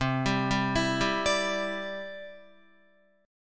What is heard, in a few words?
C Major Add 9th, Guitar, Cadd9 chord; arpeggiated; JGuitar